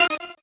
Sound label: normal hit normal